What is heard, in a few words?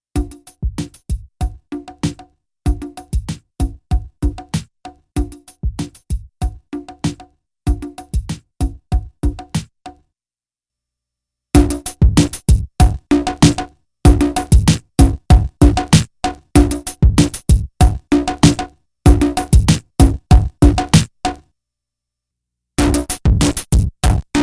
stereo fuzz beat